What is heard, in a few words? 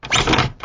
grow; Builder